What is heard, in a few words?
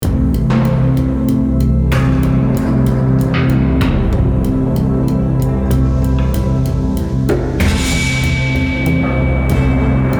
Action Loop